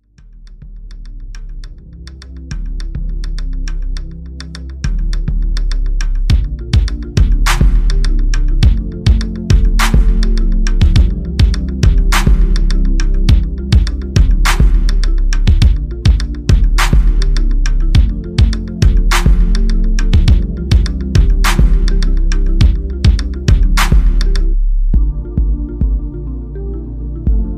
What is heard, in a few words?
Call Ringtone